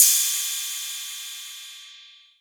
Cymbal, Novation Drumstation

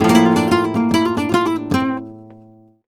guitar a#, Flamenco Remates De Pulgar; ProSamples vol.46 - Flamenco

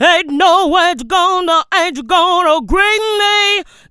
VOX, Funky Vocals; USB Soundscan vol.18

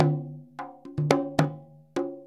MLY DRMS; Spectrasonics - Heart of Asia CD2

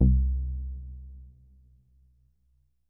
C1, synth waves; Music Store Sound Service; Einstein

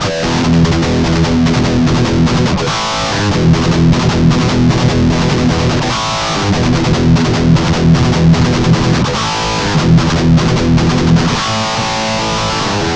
Guitar Licks

